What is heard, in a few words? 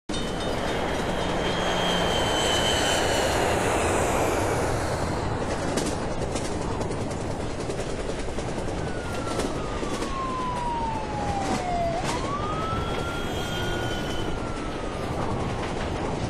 ambient; Marc Ecko's Getting Up: Contents Under Pressure; XBOX